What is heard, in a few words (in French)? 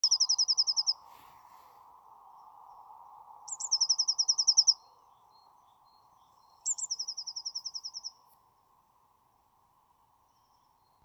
Chant Mésange bleue; Gour des Veroniques